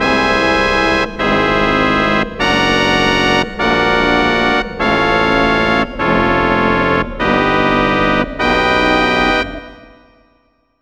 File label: Appears Fanfare; Jinjonator; Banjo Kazooie